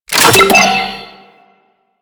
Download Context Action; Warframe

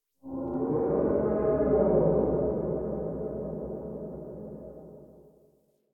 Exterior Distant Calls Scared; Ambience; Duviri; Warframe

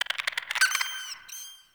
Landscape Plant Critter, Ambience; Warframe; Venus Open World; One Offs

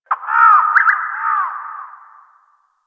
Bird Distant; Warframe; Ambience; Eidolon Plains; One Offs

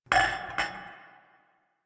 Glasses Clink; Warframe; Quests; TheNewWar; Ambience; Zariman

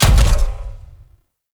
Mech Step Run; Warframe; Vehicles; NechroMech

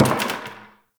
destructible bucket impact

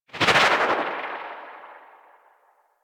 Atmosphere Flyby Left, Vehicles; Movement; Warframe; Archwing

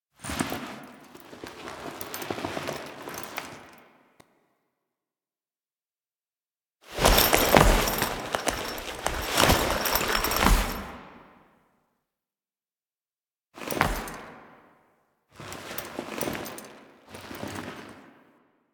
Foley; Duviri; Teshin Death

